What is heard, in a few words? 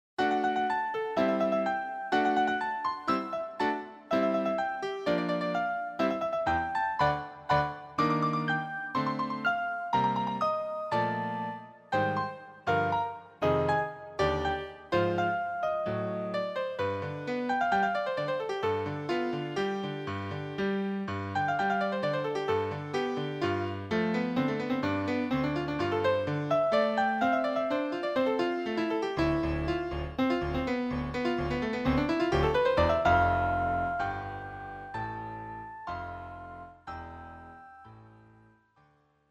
fanfare; This piece is uncompromisingly active, incessantly pulling the listener toward a tempestuous avante-garde ending.